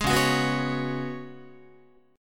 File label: C sharp 7th Suspended 4th C sharp, Guitar; C#7sus4; strummed; tuning=4A,4E,5A,5D,5G#,6C#; guitar chord